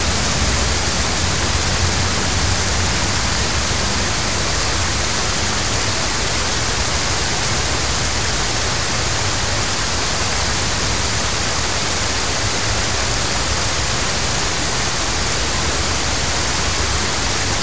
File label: Waterfall